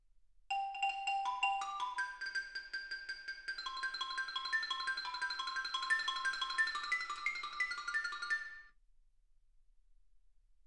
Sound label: xylophone